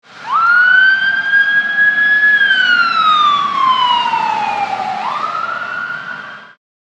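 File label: emergency vehicle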